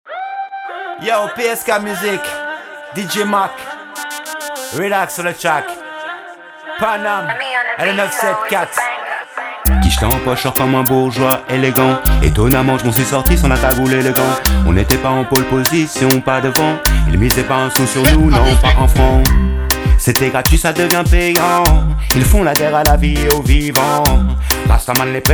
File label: vocal